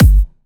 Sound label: 128BPM The Deep Kick; Vengeance Samples